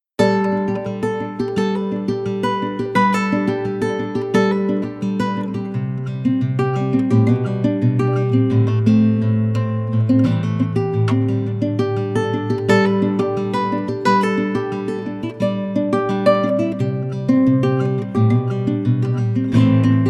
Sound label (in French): Requinto, guitare originaire d' Espagne; Requinto esteve